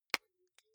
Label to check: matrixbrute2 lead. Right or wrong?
Wrong